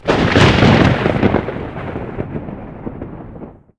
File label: Thunder